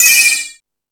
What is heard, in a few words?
House Machine, Drum Machines